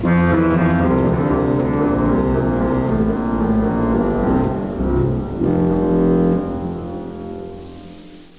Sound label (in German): Pedal; Martin